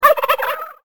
turkey

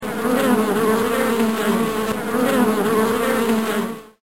bee swarm

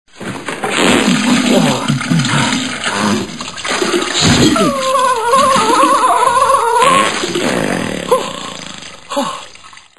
squirt

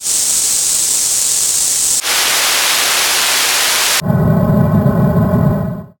steps

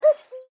cuckoo

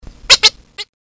quack quack fast

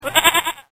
goat

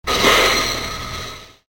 Drilling Rig

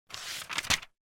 page flip

